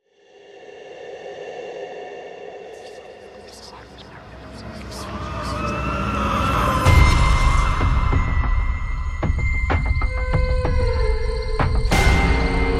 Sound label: Haunted